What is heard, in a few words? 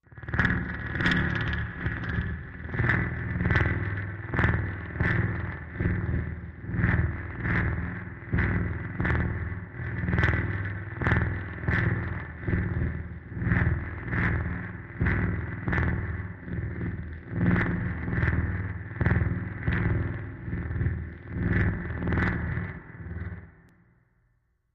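Reciprocating Wedge Machine, pulsing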